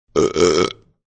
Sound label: Burp; Burping; Human